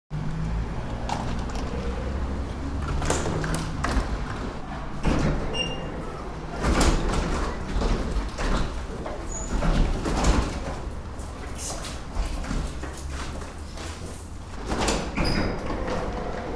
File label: Automatic Doors, Automatic opening and closing doors with people passing through; Automatic Sliding Door; Automatic opening and closing doors with people passing through in public building.